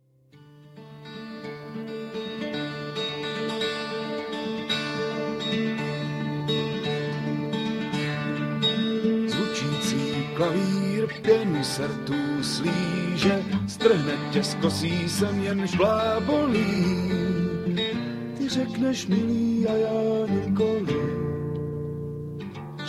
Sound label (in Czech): Struny Ve Vetru Zvucici Klavir